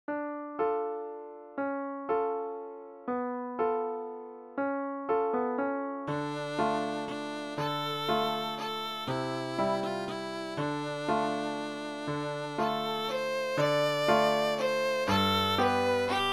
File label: violin solo; scarborough fair